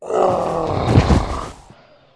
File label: snake dead